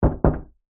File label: household window glass knock